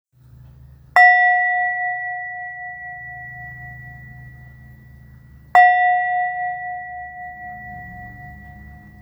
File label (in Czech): bohyně, tibetská zdobená mísa; 496g; tara